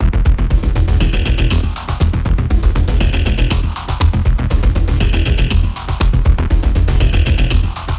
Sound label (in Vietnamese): Nhac nen phim manh me cang thang ngan